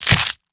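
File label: attack pincer, creature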